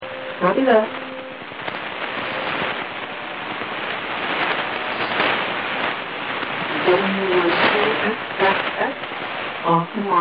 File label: making breaking contact